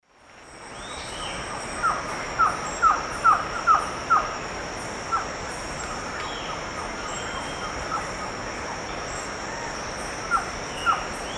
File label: Trogon viridis, song, Eastern Lowlands; S. America